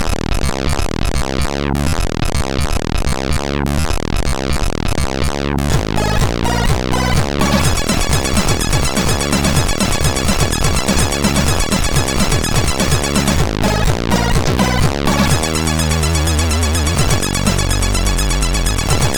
Finnish tune, CSG8580R5; hvsc

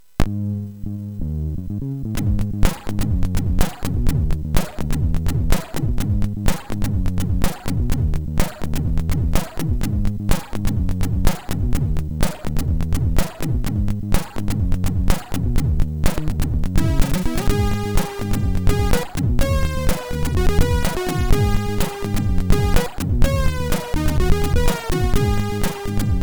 Crack Intro, sid MOS6581R4

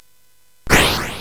Dark Castle, CSG8580R5

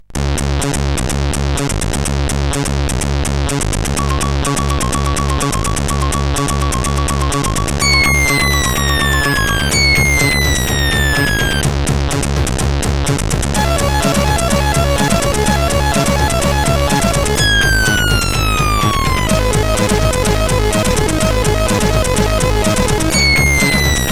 Yippeeeee sid CSG8580R5

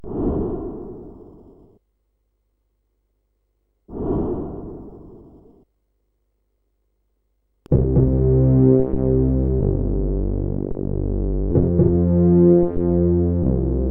CSG8580R5; sid

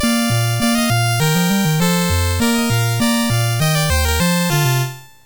Ditty, MOS6581R4

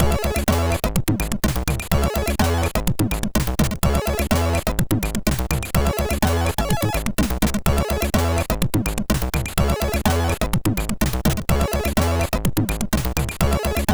MOS6581R3, sid; Two Hours

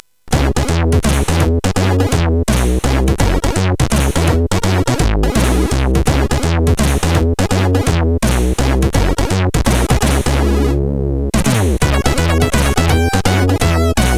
Instruments, sid CSG8580R5

